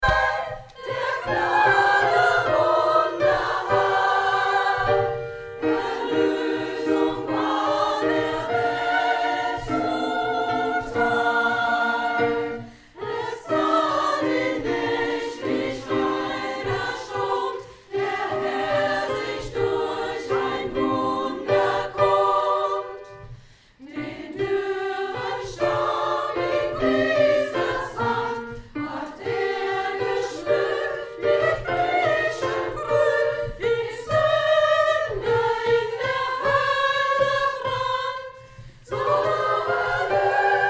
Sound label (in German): Pilgerchor alle (ohne Anfang); Wagner Tannhäuser